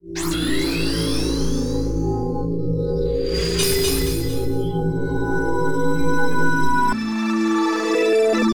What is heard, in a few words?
Logo; Game Freak